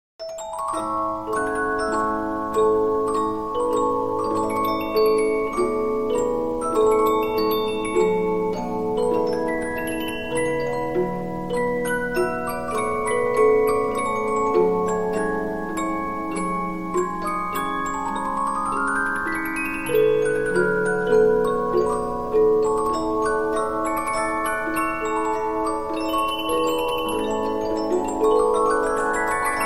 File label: Polyphon, 15inch disc musicbox